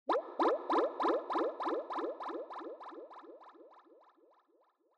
Scene Fantasize Slow

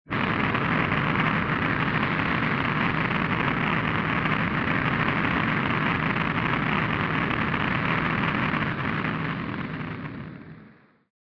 Retro Anime Jet High Long

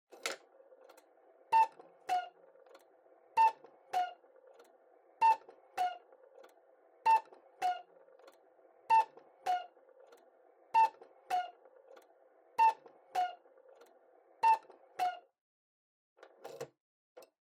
Cuckoo Clock Long

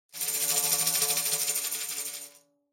Tambourine Roll

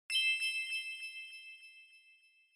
Bell Accent High Delay

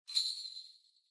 Ring Bells Dry Knock

